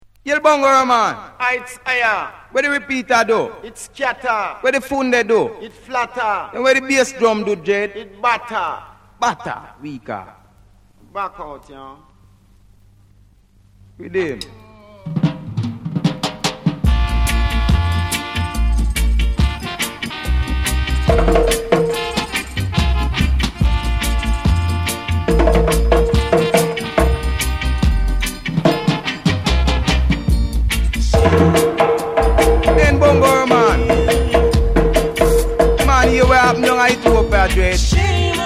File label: African Drums